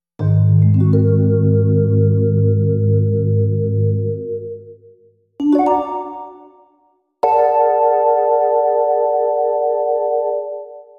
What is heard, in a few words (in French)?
VIRGULE VIBRA